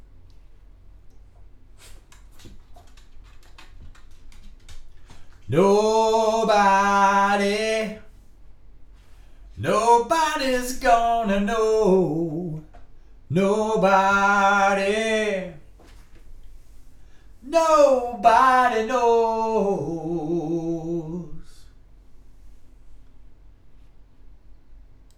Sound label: vocals